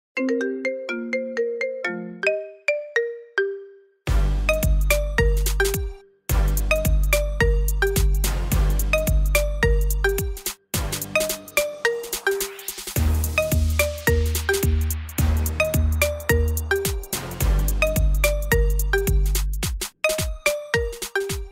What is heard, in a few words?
Marimba Remix